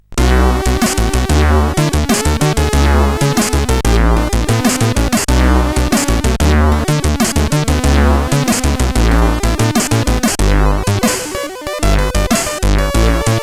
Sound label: Intro Tune, CSG8580R5; sid